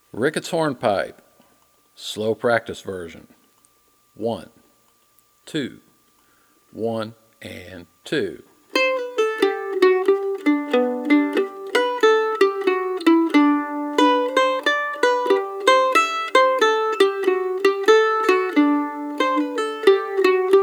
Ricketts Hornpipe Practice Version

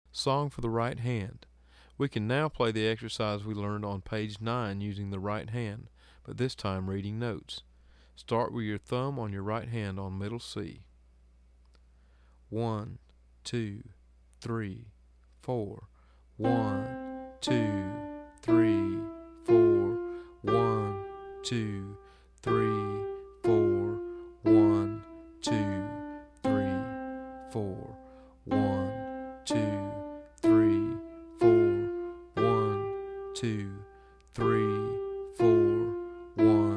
Slow; Song For The Right Hand

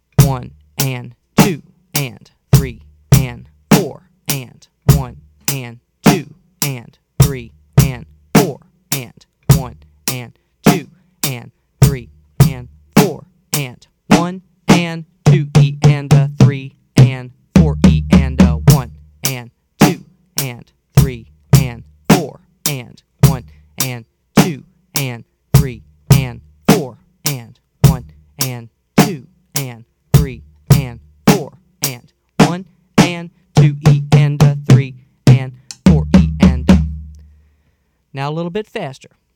Beat 2 With Fill Slow

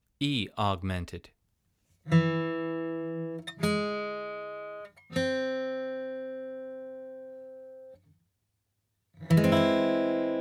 E Augmented Chord

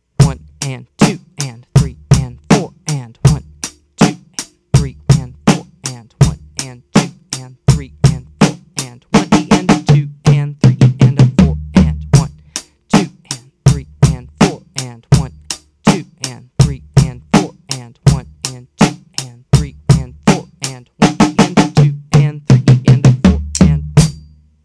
Beat 2 With Fill Fast

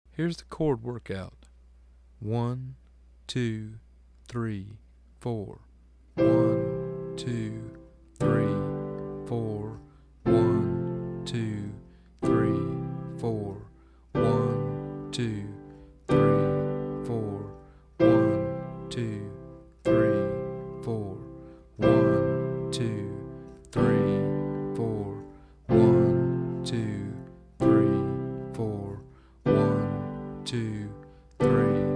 Chord Workout Slow

